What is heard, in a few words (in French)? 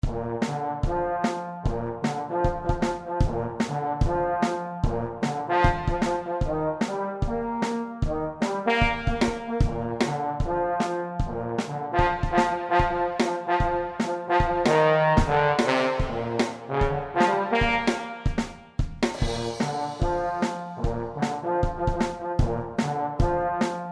trombone batterie